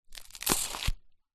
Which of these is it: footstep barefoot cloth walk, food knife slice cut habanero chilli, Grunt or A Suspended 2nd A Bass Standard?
food knife slice cut habanero chilli